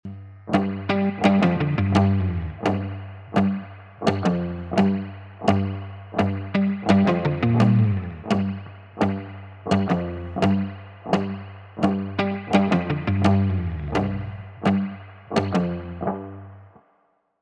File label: Gtr Buss; HR12